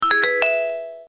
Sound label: Notifier Contentment; Blackberry Bold